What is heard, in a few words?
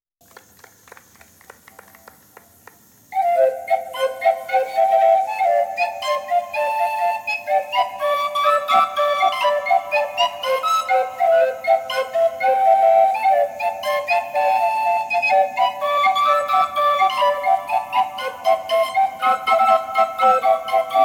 Cello